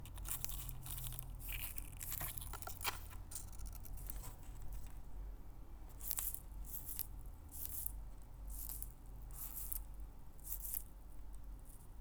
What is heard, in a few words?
male wing protest sounds